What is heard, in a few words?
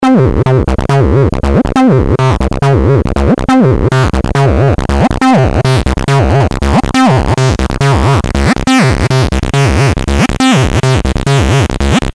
distort